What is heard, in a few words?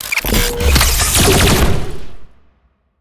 archeology event trap laser; ancient relics